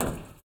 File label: roof step; Golden Crayon Sounds